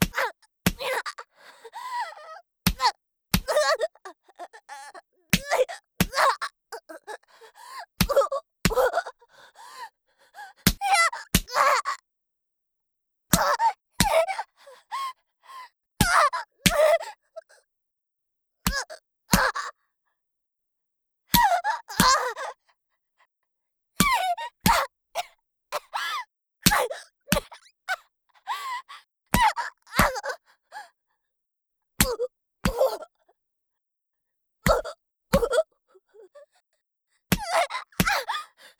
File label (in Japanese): ストレート(威力高め) BPM90 ワンツー; 効果音あり